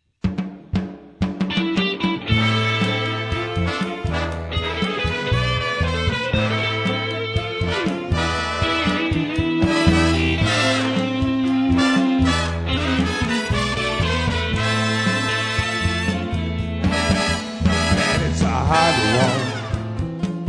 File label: Smooth, jazz